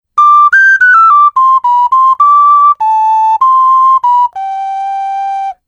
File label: Soprano jingle, ocarina